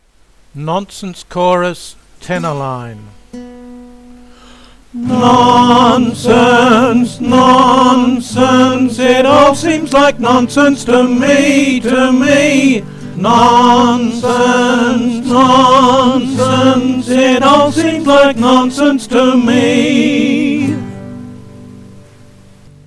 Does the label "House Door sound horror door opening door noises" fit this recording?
No